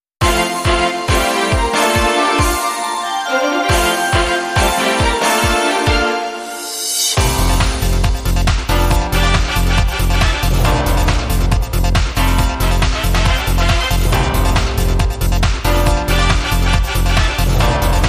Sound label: Rainbow Strings, Underscore